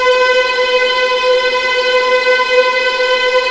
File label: VLA A#2 TRM R, VLAS TREMOLO